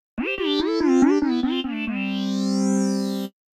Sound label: Razer